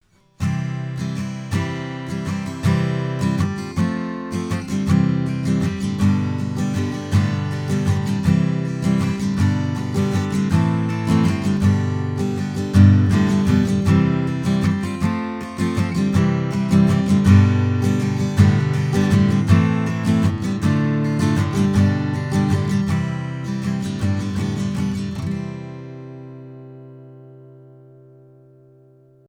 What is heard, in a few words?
Acoustic Git Mic Zoom L 12